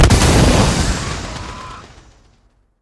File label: big Win Text; jungle spirit